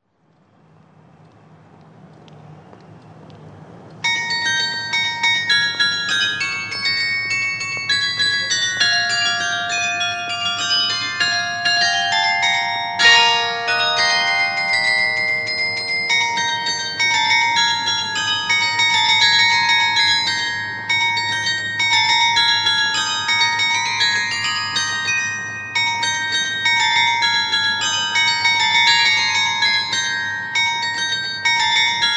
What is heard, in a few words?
carillon